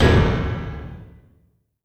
BD, Industrial; USB Soundscan vol.24; AKAI

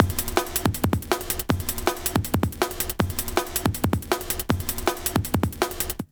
160 LOOP, Explosive Jungle; USB Soundscan vol.05; AKAI

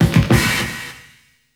BREAK, Hip Hop R n B; USB Soundscan vol.19; AKAI

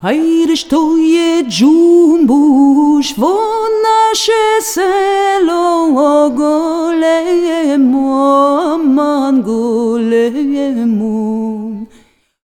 VOICES; USB Soundscan vol.63; AKAI

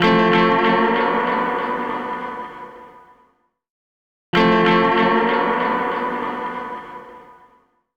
Hawaii, Guitars; Zero-G Smoke

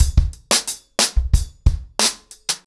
ACOUSTIC DRUMS, 90 BPM

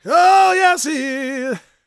GOSPEL MALE; Spectrasonics Vocal Planet

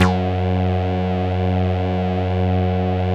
CS-15, BASSES

